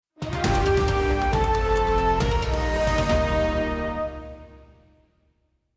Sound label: Sting; Sitting Duck Music; Good Morning Britain